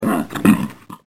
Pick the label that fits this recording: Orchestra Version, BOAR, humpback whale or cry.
BOAR